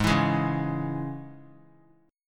G sharp Suspended 4th Sharp 5th G sharp; G#sus4#5; strummed; JGuitar

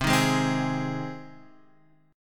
C Minor Sharp 5th C down Guitar Half Step Down

